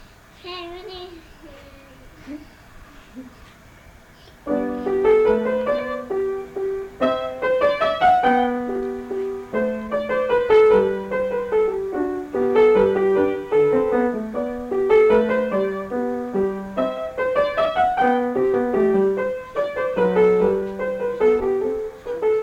Minuet in G

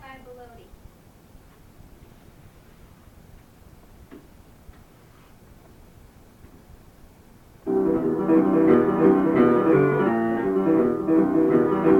Firefly - Music Club Rehearsal; Battelle Music Club

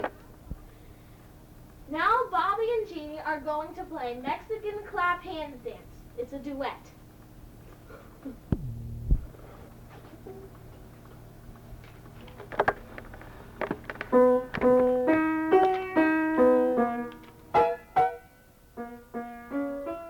Mexican Hat Dance Duet

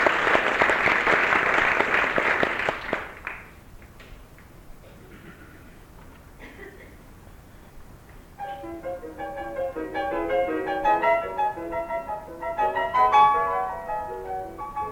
Recital

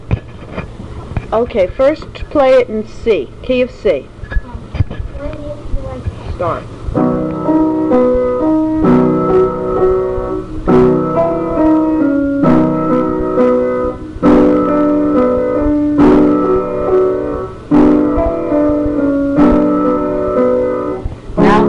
Major-Minor song; From Yamaha Music class piano with Mrs Chu; changing keys on sight

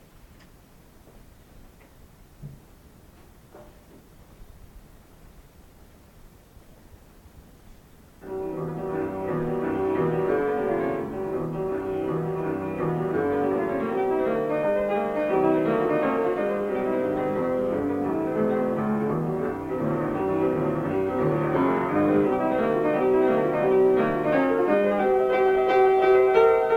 Firefly - Music Club; Battelle Music Club Nov82

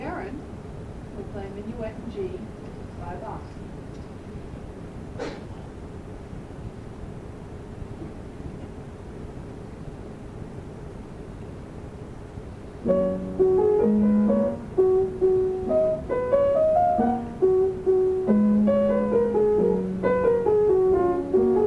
Practice Minuet in G; Bach

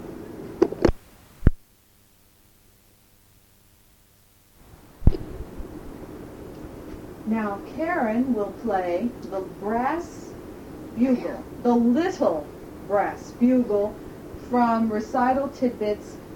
Brass Bugle; (Recital Tidbits Book 2)

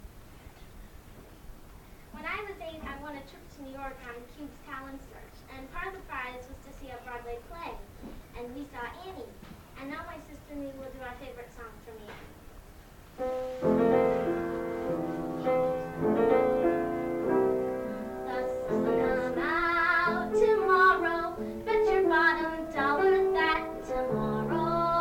vocal; Battelle Music Club Nov82

